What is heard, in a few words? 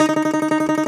DOMRA D, Prima Domra, Plucked Strings; E-MU Producer Series Vol. 7 – Old World Instruments